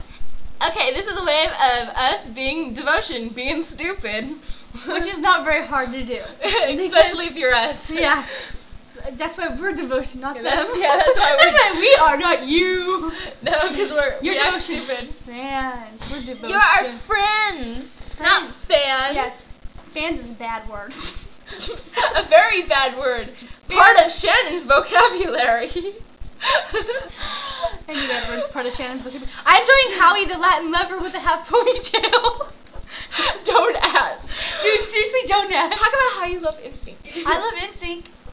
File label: ass slap